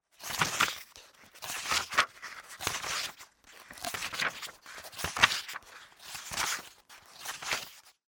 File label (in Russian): kniga v mjagkoj oblozhke